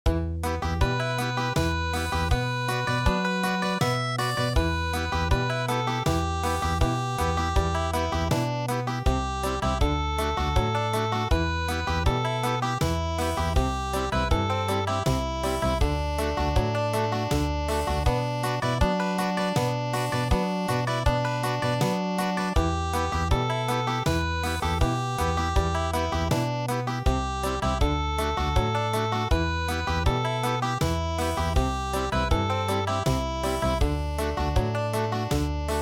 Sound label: Country, midi